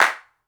big room clap g sharp key; percussion; single hits one shots

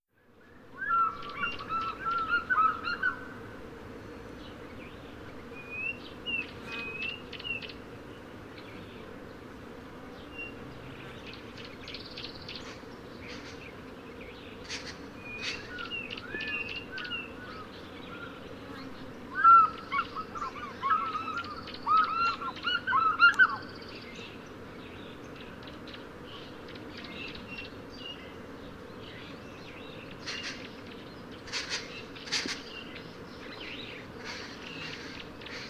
african fish eagles

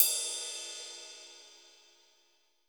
ride cymbal a key; cymbal drum samples

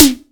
studio tom drum single hit d key

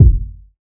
round kickdrum sound g sharp key; kick drum samples

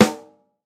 big room steel snare drum sample A key

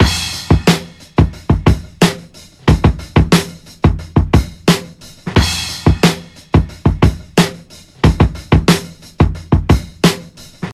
90 bpm breakbeat f key, kick tuned to the F note

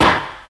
damped disco steel snare drum sound f sharp key

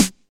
studio acoustic snare b key

